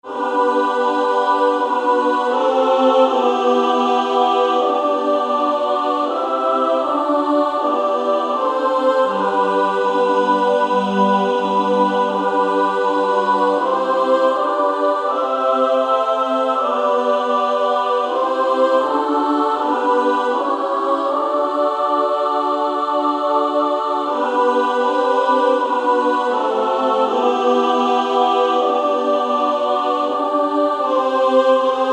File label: Female Choir SSAA; SSAA 4-part